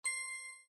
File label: bling